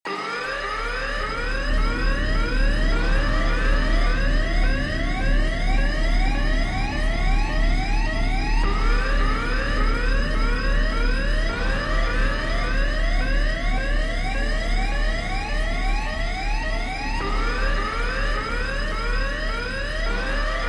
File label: Ambience; escape the backrooms